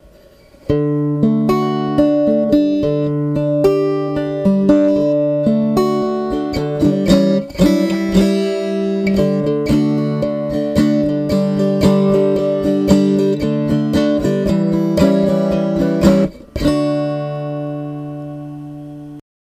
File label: cardboard folk guitar